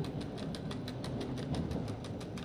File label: hasler, Train; EN57-815; OpenBVE